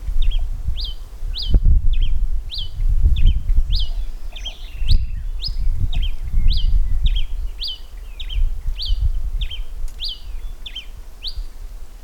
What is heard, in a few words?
Coryphospingus